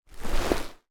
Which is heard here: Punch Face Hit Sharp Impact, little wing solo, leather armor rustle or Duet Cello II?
leather armor rustle